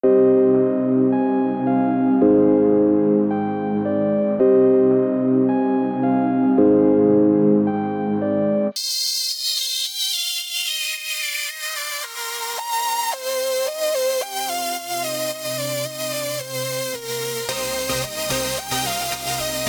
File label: electro